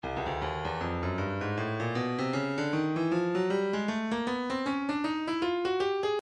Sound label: breakbeat midi files 075 079 bpm; Slithery A; Stylus Breakbeat Midi Files